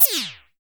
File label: VIDEO LG M, PERC; TEK PERC3